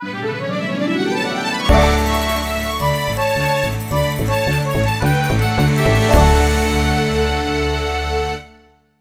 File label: Time Attack Goal